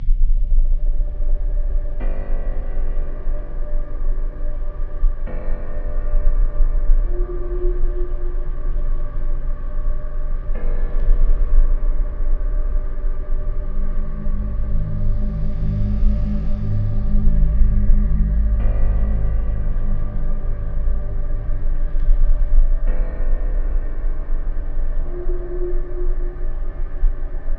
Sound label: Ambient Music; evacuation; windows gamerip 2023